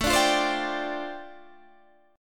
F 6th Add 9th A, chord

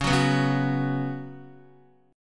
Db 7th Suspended 4th Db down Guitar; JGuitar

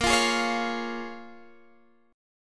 C sharp 6th, A sharp

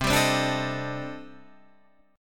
C Minor 6th Add 9th C

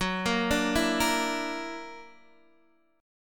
F sharp Augmented 7th F sharp Guitar DADGAD

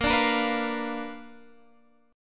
B Minor Major 7th Flat 5th, chord

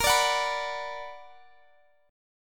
B Minor Bb; Bm/Bb; strummed

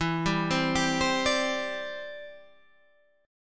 E Minor 7th Sharp 5th E, Guitar Half Step Down

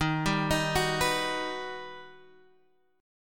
G Augmented Major 7th

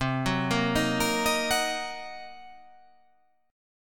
C Minor 7th Flat 5th, chord

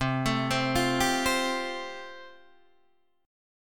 F Major Add 9th C, Guitar Standard; Fadd9/C arpeggiated; JGuitar

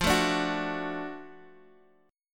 Gb Minor Major 7th Sharp 5th

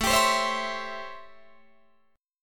A 7th Sharp 9th, Guitar, Open D

